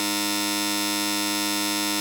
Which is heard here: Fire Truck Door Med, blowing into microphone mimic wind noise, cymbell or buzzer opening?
buzzer opening